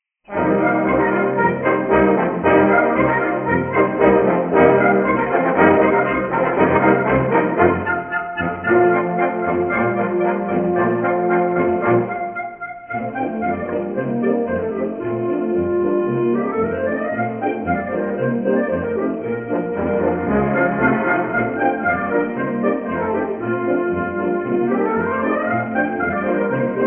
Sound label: sleighride; cstrike